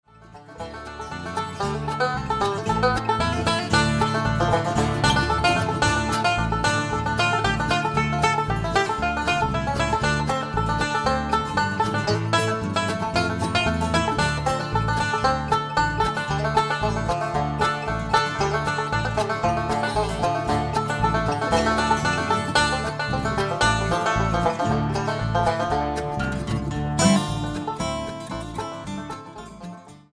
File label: Blues; Nashville